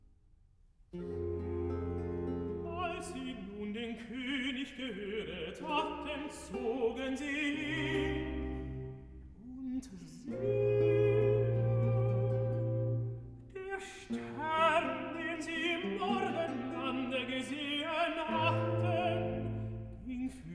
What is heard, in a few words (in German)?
Recitative, tenor; Evangelist; Als sie nun den Koenig gehoeret hatten